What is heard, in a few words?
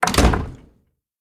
wooden door close; Devour